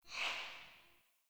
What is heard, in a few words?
Soul Steal Latent Out; Powers